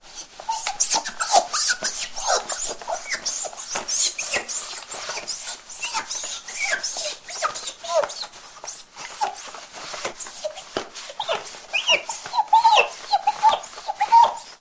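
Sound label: mirror sounds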